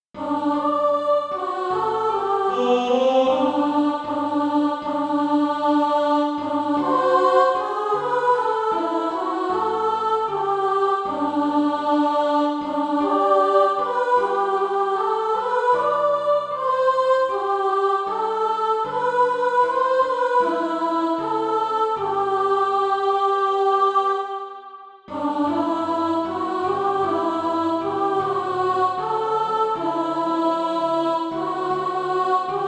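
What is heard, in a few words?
soprano, Practise Music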